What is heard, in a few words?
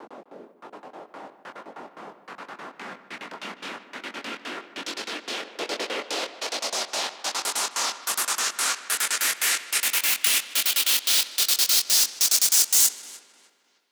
Noise FX; SFX